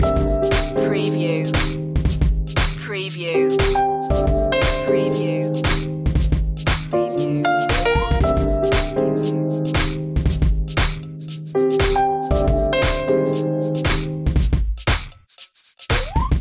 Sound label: strange new funk loop